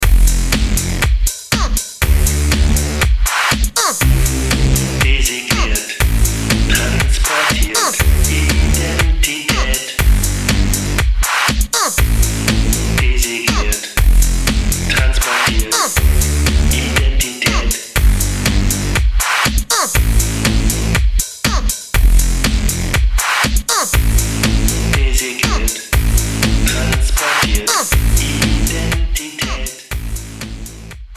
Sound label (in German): song techno